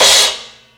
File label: Crash Choke, Cymbal; Drum n Bass; One-Shots; KJ Sawka; Drum n Bass & Beyond; Drum Tool Box